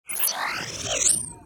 BGM Start; the initial

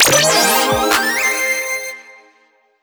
Team Picked; Splatfest

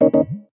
Warning

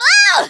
Barrel Bail Female; Kelly Slater Pro Surfer; macOS, Windows gamerip

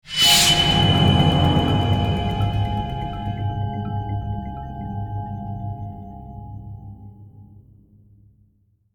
Success Stinger; The Walking Dead: The Telltale Series

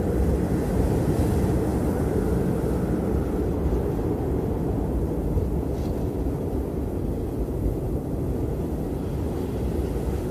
SFX Winter Blizzard; Escape from Russia: Mobilization

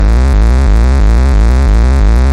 Alarm

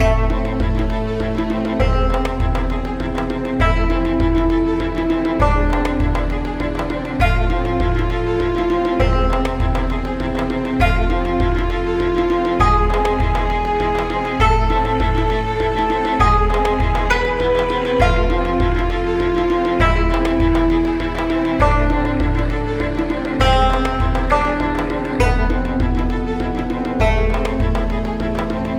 Tension Loop 6-4 100bpm 8bar; project zomboid

